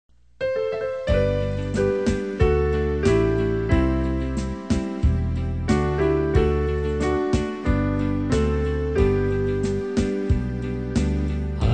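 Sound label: soprano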